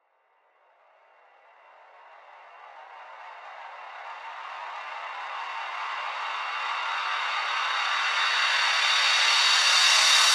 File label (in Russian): vzhuh dolgij v prostranstve